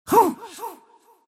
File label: pain; Rubick